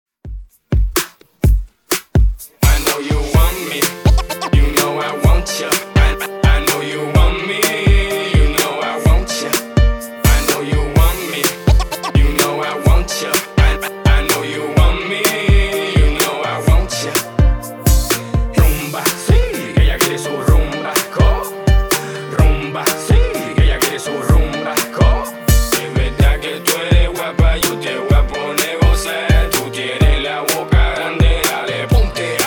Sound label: Intro Hype Redrum 126Bpm